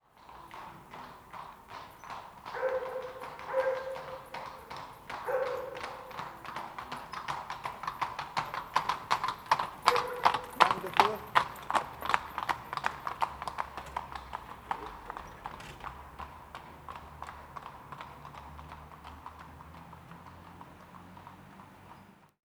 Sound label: Rhythm Horses